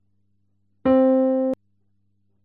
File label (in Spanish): do grave